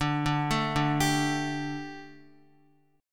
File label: D Suspended 4th D, down, Guitar; Dsus4 arpeggiated